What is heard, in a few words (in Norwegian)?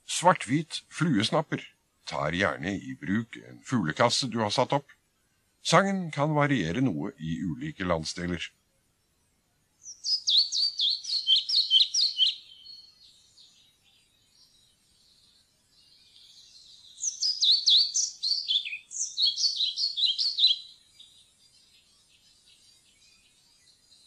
svarthvit fluesnapper